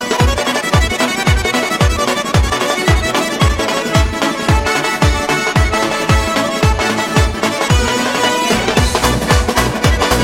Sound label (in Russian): dagestanskaya lezginka